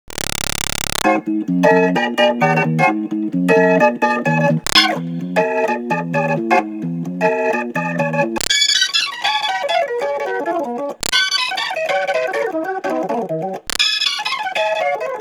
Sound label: B3 Organ; Masterbits CLIMAX 8